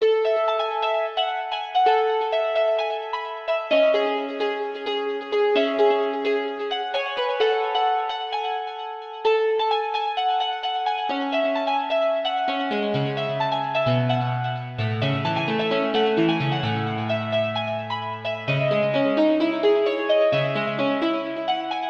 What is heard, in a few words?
Piano; Kingdom Hearts